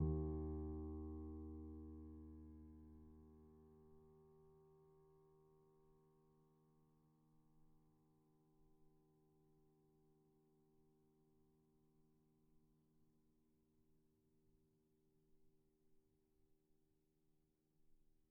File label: D#2, Grand Piano; bitKlavier Grand; Piano Bar